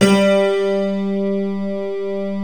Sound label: PIANO G3; M1+D50